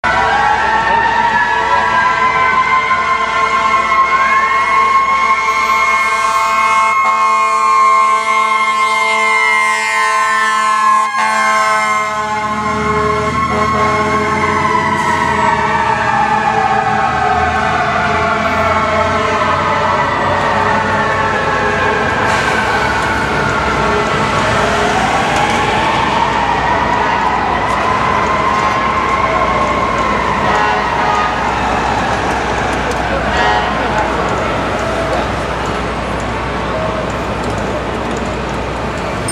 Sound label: Fire Truck Siren, New York